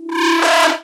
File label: Tonal FX E